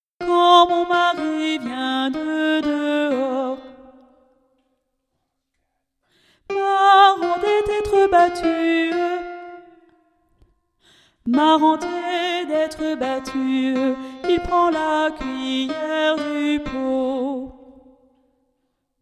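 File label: alto; Quand mon mary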